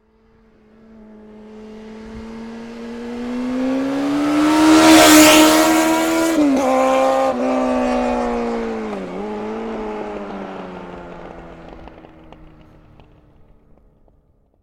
transport motorcycle honda hornet race bike pass fast; fork media